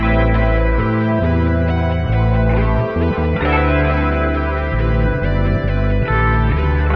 guitar

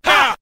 "ha", Voice

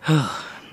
huh relief, Voice; ooh ahh

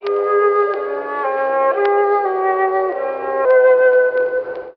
flute, Sad

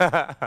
laugh

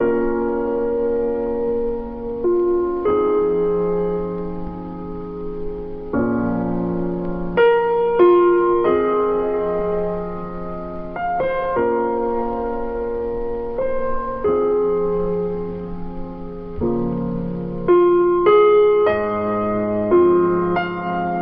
piano, sad

